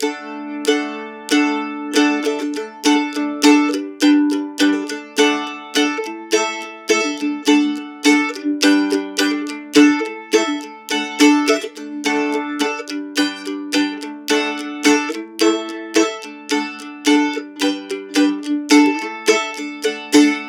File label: open boom chuck, mandochords